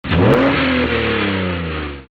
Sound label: Nissan Rev; Wingo; Cars